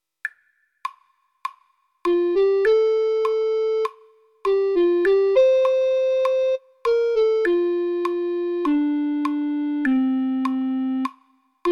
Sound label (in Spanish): titanic flauta